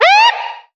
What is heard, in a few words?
"woot"; ooh ahh; ho hoo woo